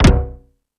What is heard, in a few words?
FX Sounds, Drum Machines E-MU Pro-Cussion